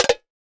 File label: Foley (Can)